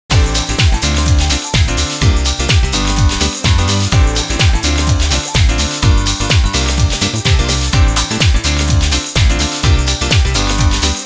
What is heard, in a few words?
LOOP; Dance Dance Revolution 2nd Mix